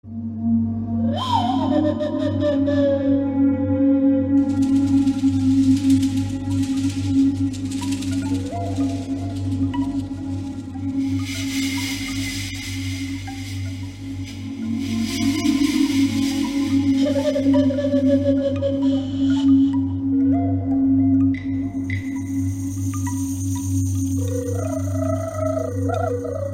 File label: music